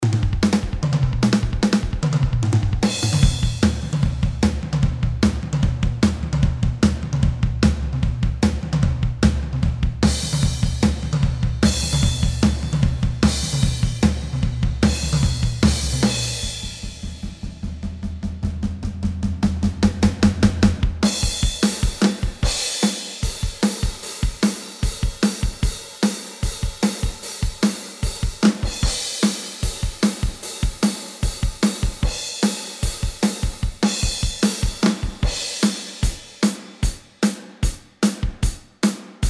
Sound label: drums power rock; handy drums